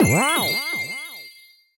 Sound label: Player Stage In; SM3DL